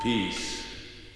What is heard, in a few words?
VOC